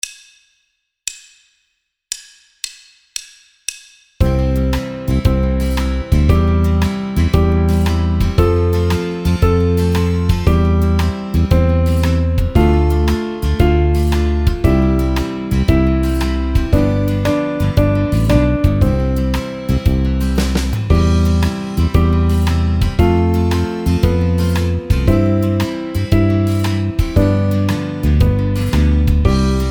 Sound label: ABC song Piano Accompaniment